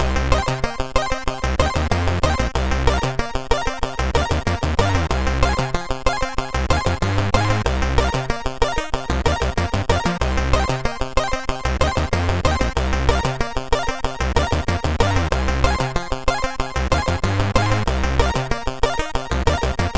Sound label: Reggae Trip, MOS6581R3